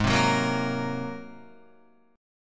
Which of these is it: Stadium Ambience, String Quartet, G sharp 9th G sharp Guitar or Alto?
G sharp 9th G sharp Guitar